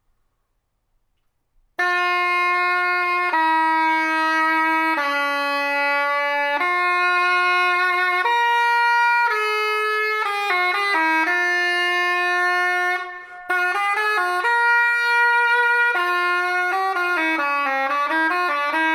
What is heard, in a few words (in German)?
pommer; musikinstrumentenmuseum